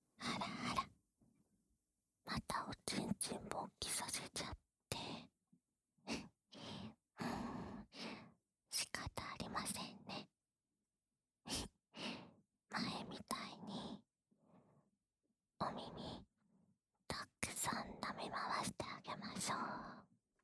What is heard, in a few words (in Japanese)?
耳舐め（右耳）; オナサポ用